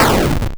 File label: exp short hard, Explosions